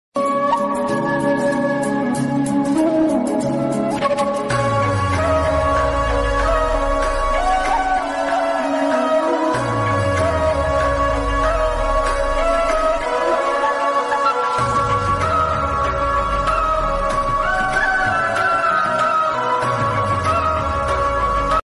radhe radhe barsane wali radhe instrumental ringtone bhakti ringtone